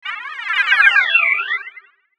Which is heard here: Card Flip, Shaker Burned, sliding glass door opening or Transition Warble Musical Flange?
Transition Warble Musical Flange